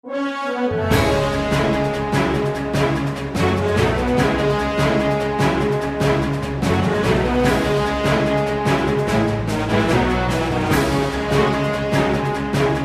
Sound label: Menu; Ssbb